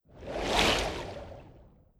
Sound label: Coral Filtration Water